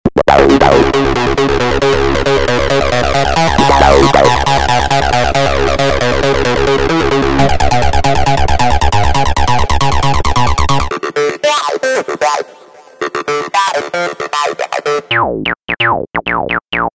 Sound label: Acid 303 sounds